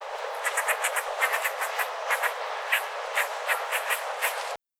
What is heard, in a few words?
eastern gray squirrel